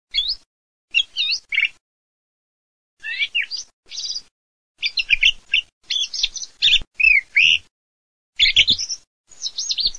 TORDO

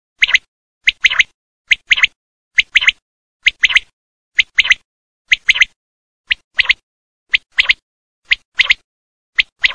quaglia

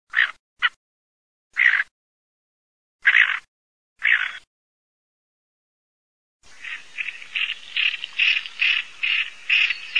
MORETTA GRIGIA

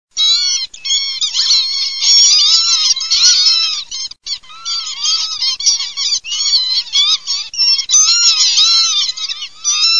pavoncelle

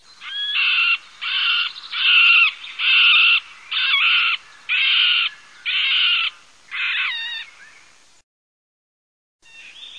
PICCHIO ROSSO MEZZANO, birdsound

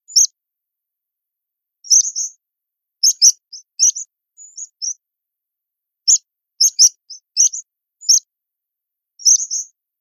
Spioncello richiamo

